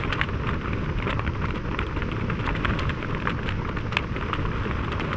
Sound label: FIRE Campfire Calm Smooth loop; mono; UltimateFishing